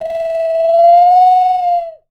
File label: bird call